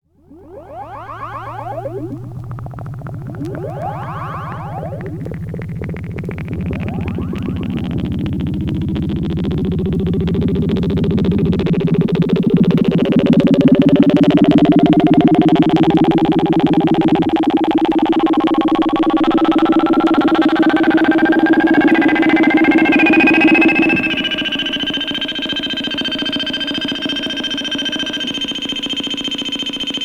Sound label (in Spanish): musica electroacustica